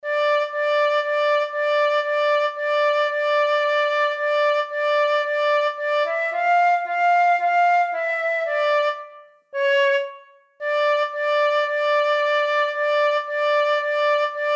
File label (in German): Czardas Floete